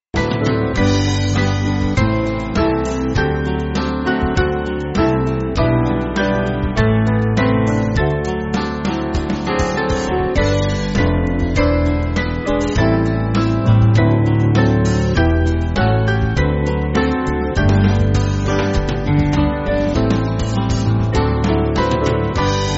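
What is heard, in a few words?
Band, Music Backing Files for Churches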